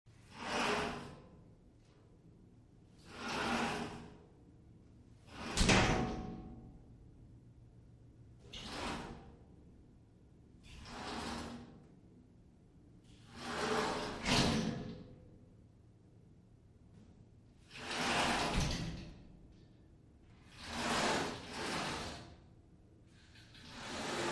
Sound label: Glass Shower Door Sli, sliding; Glass Shower Door Slide Oc, Cu, Various.